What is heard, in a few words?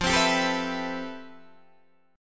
F Major Sharp 11th Guitar DADGAD, chord